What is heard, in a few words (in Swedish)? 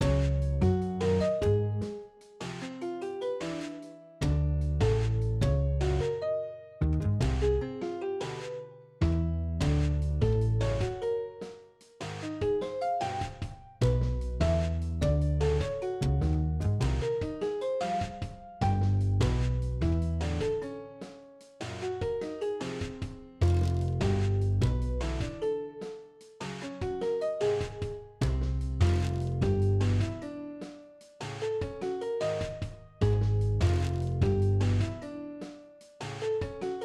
svarta tangenter vita tangenter